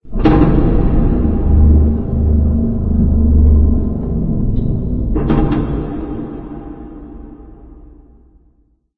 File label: warehouse ambience rand; video games; ambient